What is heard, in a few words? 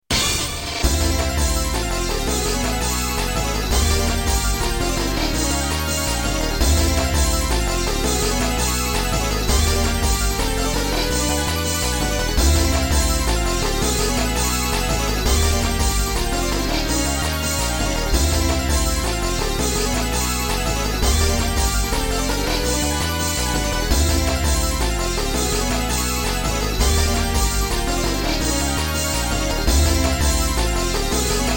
music duo team attack